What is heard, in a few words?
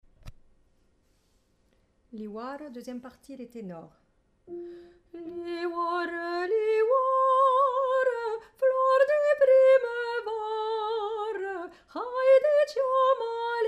Tenor